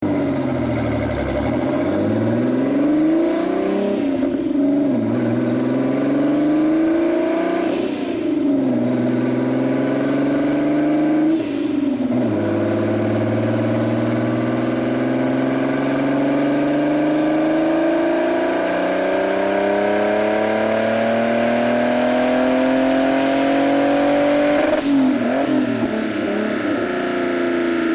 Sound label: dyno